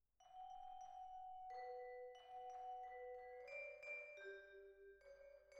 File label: Twin R Vibra